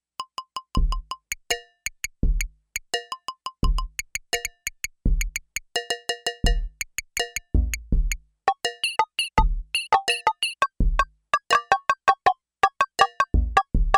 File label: Latin Perc, Yamaha DX11; Factory Performance Voices